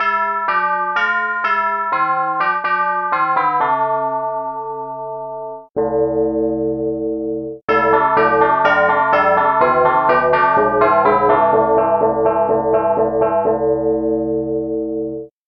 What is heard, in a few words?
tubular bells